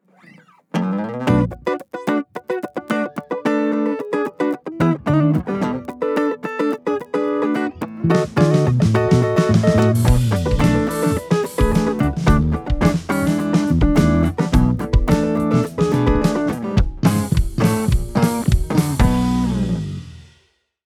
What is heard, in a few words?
SSL FUNK